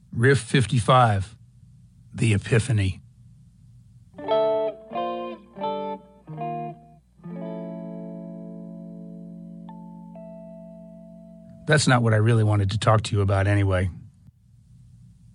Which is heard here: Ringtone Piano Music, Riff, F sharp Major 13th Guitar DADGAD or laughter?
Riff